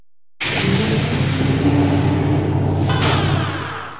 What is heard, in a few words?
holodoor sound